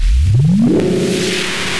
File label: power; SMB3Memes; EoSD Chiptune